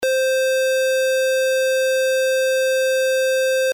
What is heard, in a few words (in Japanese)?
四角波